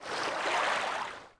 swim stroke; DAoC; Toa; Gamestar

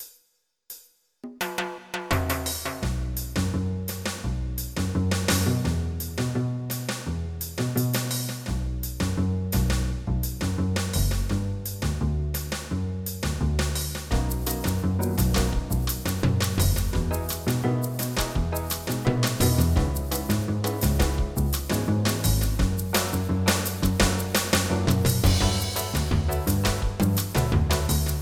SAMBA; SuperModels - Exotic and Wild Fantasies

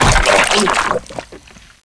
gib; SINDEMO; player; blade

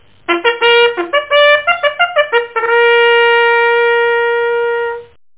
bugle call fall in

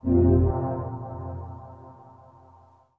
synth pad ambient 160 C; Sphere

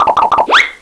flee; Paparazzi - Tales of Tinseltown; Activision

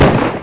gunshot; skrap

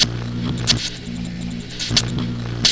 Arc Rev Pulse Loop

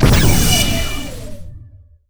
new laser; Earth and Beyond; EA Games

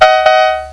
Car horn; Koji the Frog 2.0.1

